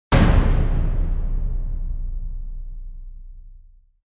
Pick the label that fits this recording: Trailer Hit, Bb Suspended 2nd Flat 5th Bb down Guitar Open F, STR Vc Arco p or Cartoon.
Trailer Hit